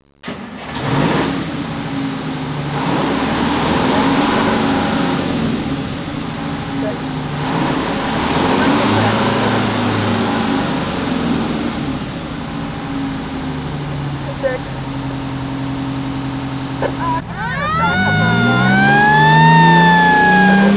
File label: fire truck start go; vehicles